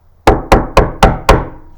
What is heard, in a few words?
door knock